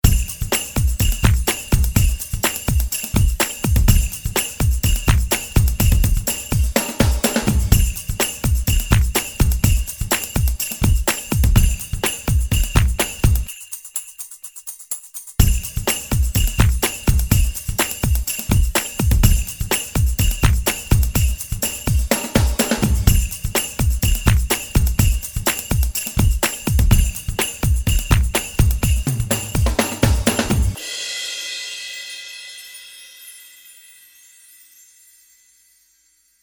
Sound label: Harmonica Swingin Xmas Rhythm Mix; SHORT BUS